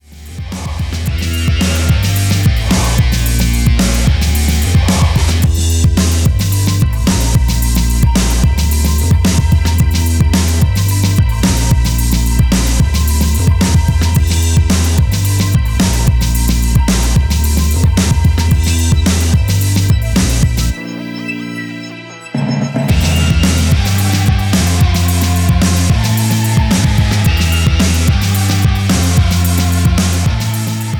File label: Instrumental